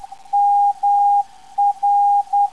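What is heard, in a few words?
non-directional beacon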